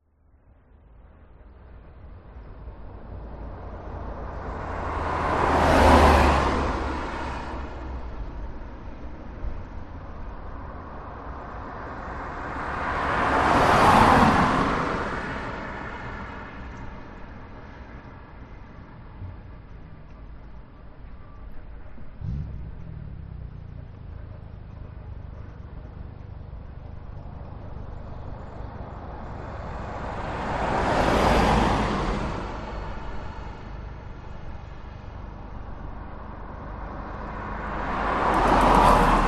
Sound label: traffic pass quiet day light construction in distance